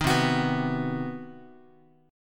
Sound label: C Minor Major 7th Flat 5th chord, Guitar; strummed; JGuitar